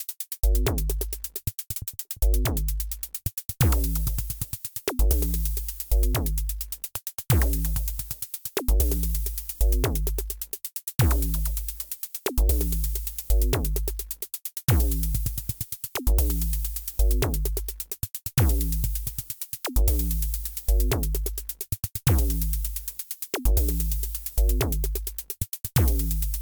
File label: Axon 3 Isometric Beat